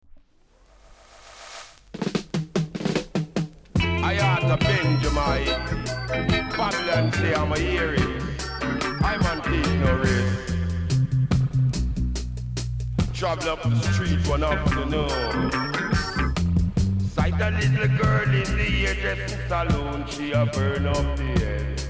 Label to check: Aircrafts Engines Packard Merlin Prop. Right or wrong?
Wrong